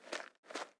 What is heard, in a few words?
steps; Rapid Gun; ipsc